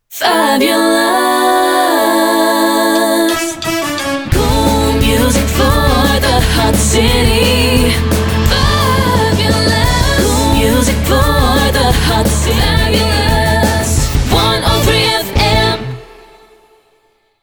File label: Jingle Slogan 87BPM